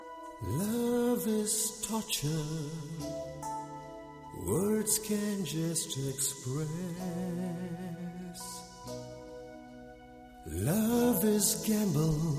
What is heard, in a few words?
Romantic Song